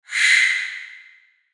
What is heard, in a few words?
Whoosh High Airy Short Reverb, Whoosh Vox Pad Flange; Blastwave; Whoosh Sound Effects